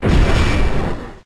Meteor Mash

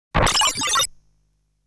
Sounds from which items appear from the box; legend of hero tonma

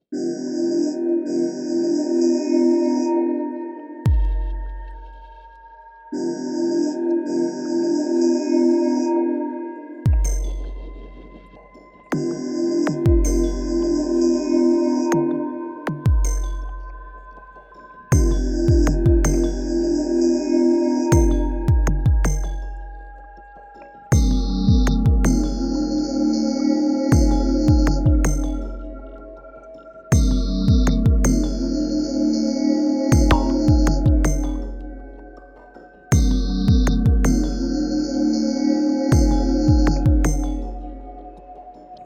World 1 Music; Cubicity

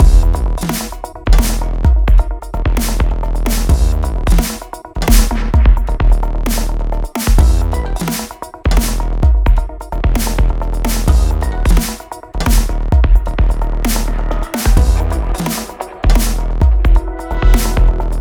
Boss Battle Main Loop Layer; Glitch's Trip; Layer 1

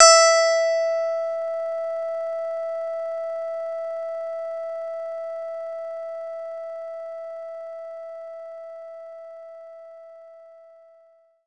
Clean Guitar E5; knights try

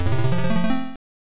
Coin Collected; fantasy world dizzy; zx spectrum 128

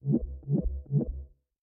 Key Wheel

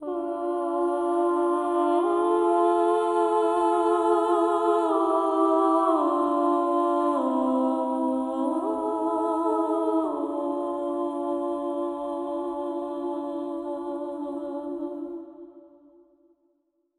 singer interlude; Tyranny Gold Edition

